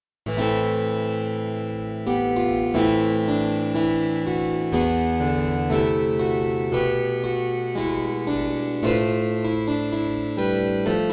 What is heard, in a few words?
meantone, harp; Gibbons